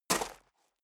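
step dress boots gravel medium single left, footsteps; schritte; menschen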